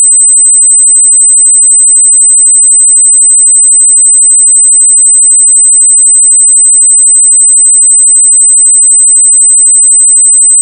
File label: average most uncomfortable sound; gender research